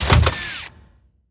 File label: UI GEAR COLLECT